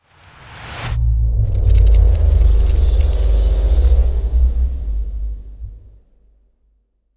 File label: trigger, portal